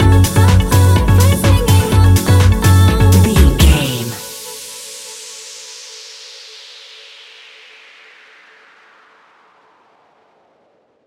tropical stinger, A fast paced piece of club music; A fast paced piece of club music perfect for the nightclub, and a youth orientated visual scene.